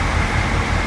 Dr14 idle; finnish

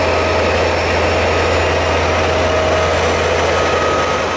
Pielstick acc; finnish

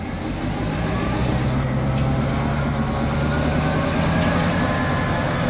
acc; finnish

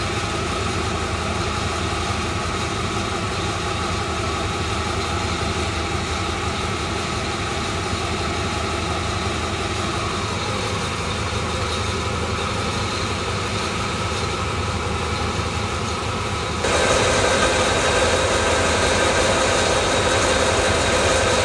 Dv12; Tyhjдkдyntiд; finnish

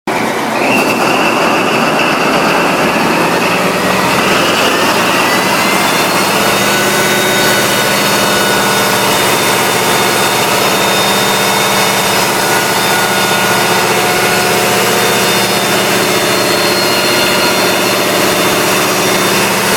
Cat 3508; finnish

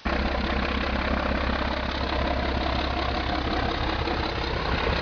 acc; finnish

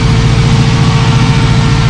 KDs1 run; finnish

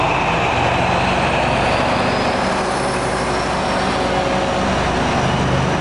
acc; finnish

